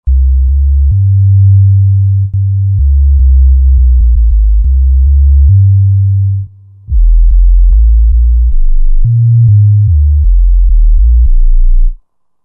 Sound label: Sinusoid Rave, Roland JV-2080